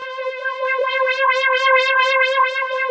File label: C5, SynthLine; 90 s Sample CDs; NiceBeats; SynthLine VOL-5